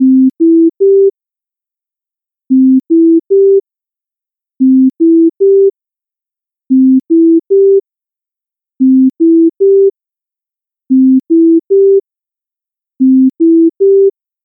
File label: Cardiac Medium Priority